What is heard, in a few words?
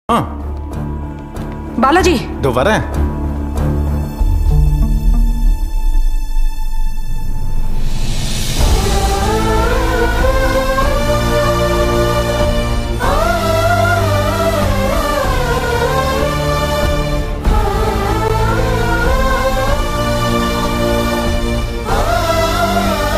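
interval background music; sita raman; prince noorjahan; interval scene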